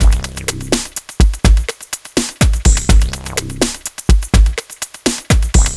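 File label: 083 Pop Combo (6-8) C major; Digidesign; Pro Tools; Pro Tools Essential Loops; Pop Rock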